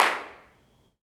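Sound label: Reverb in abandoned hospital; IRL Recorded; Reverb Enviroments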